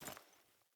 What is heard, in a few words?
Grunt Foley Movement Armour; Enemy; Sinecure; Melee Grunt